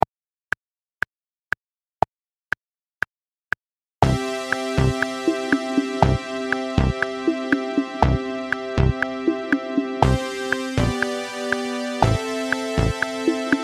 Improv 8 Bar Pop Groove Based

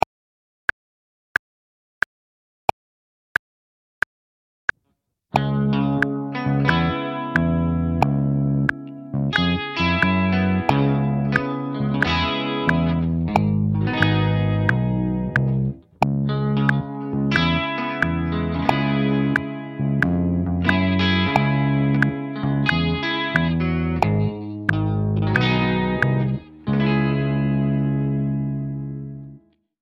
8 Bar Jazz Rhythm Based

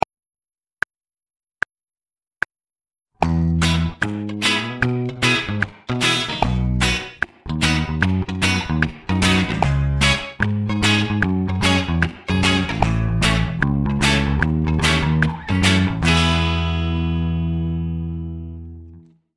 Reggae Rhythm Improvisation

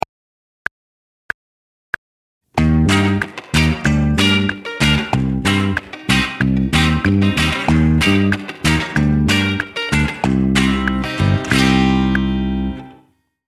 Reggae Groove Based

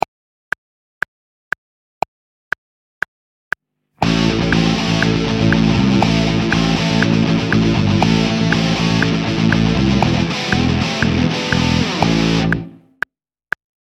Groove Improvisation Rock

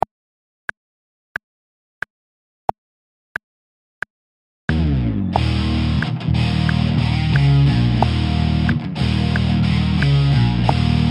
24 Bar Blues Rock

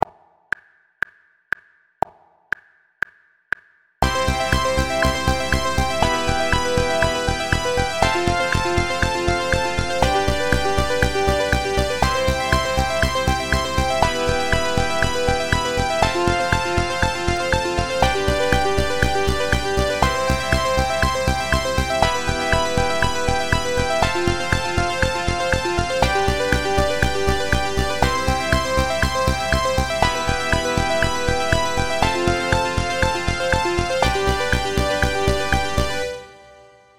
Polymeter 34 44 Backing, Waltz In 44; Grooves; Concepts

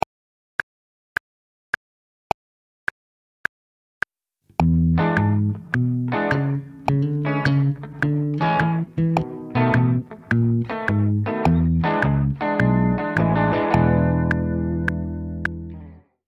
Jazz Rhythm Improvisation

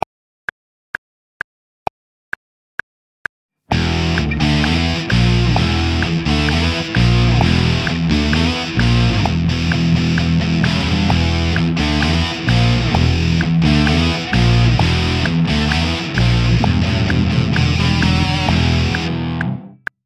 Rhythm Improvisation Rock 8 Bar

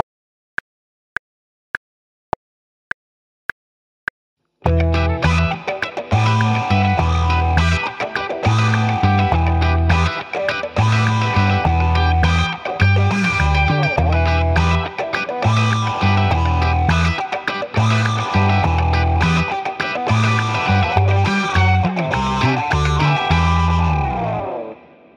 Improv 8 Bar Funk Rhythm Based

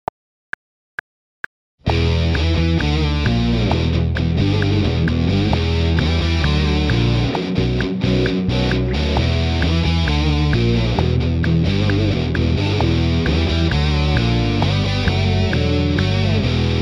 Groove Improvisation Rock 8 Bar

